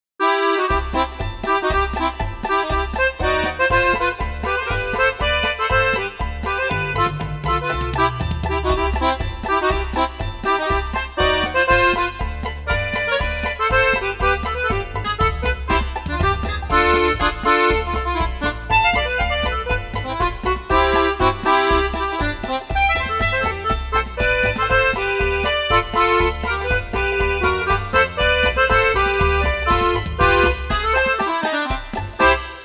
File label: polka